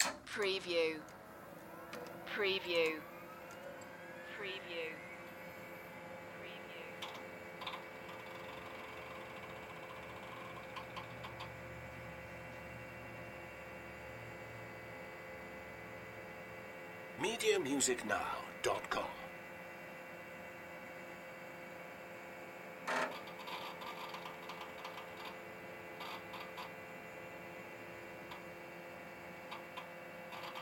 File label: Computer Boot Up